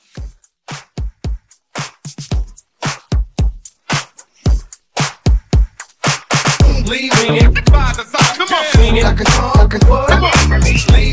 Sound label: Hype Scratch 112